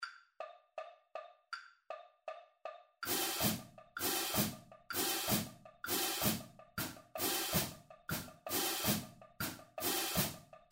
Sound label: Accent Tap 160 bpm Cymbals